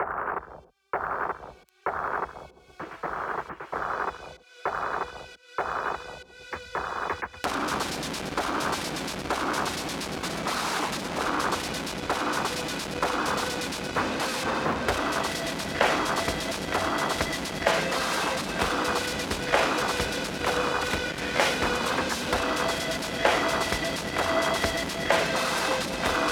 remix

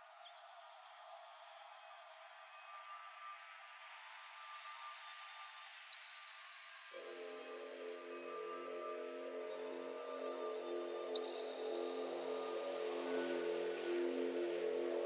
hard progressive fullon psy trance remix

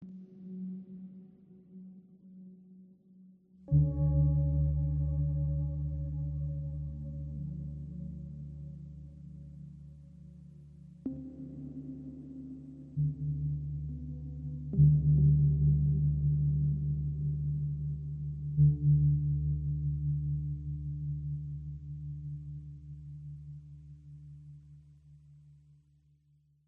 ambient

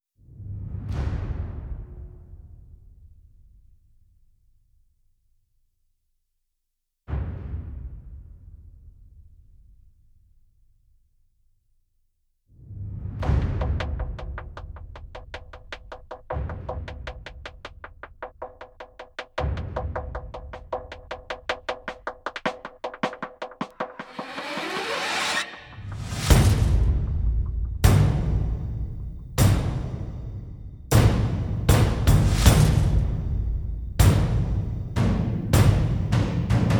percussion mix

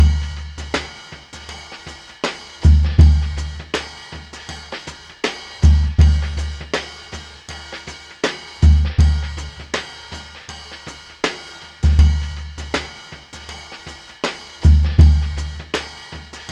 orchestra jingle bells

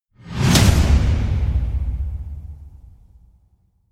whacks assault with whoosh

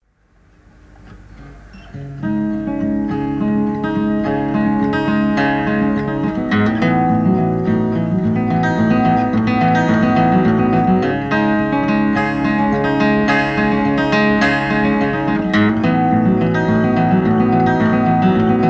guitar instrumental

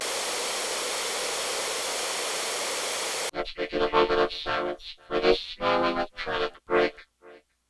small electronic break

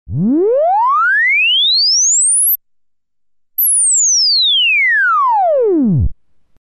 left sweep

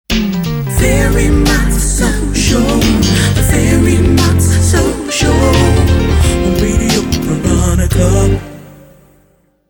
soul show jingle

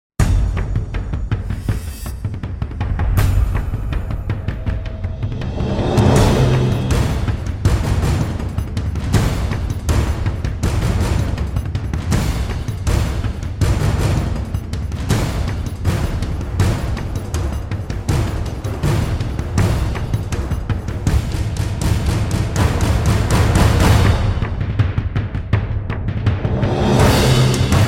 drums

